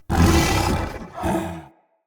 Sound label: Corrupted Battle Dog Attack Breathe Snarl Active Idle; Animals; Vocals